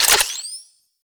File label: Shotgun Quick Reload Layer; Pax Duviricus